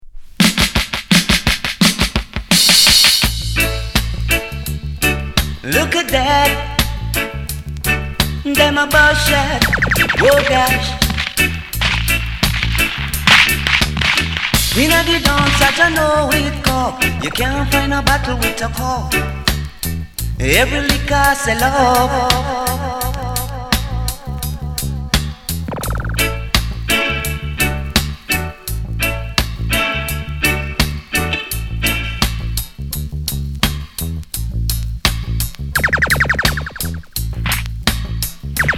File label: VERSION